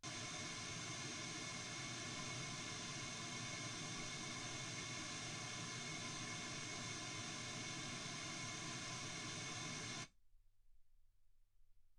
wgn 48kHz

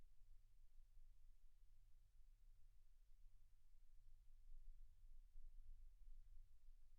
silence 48kHz

